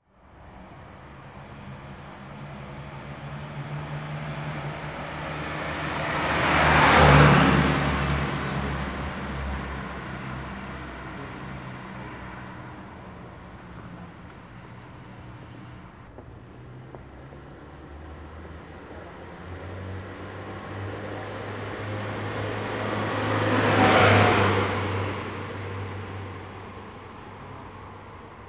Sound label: Empty Street Passing Cars